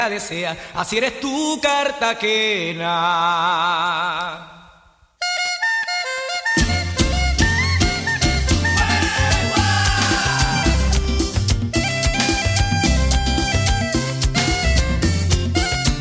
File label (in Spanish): cumbia